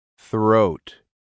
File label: throat